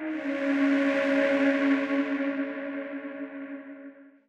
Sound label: echo layer; weapons; dota; earthshaker